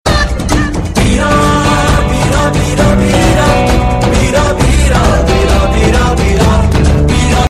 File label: New Sms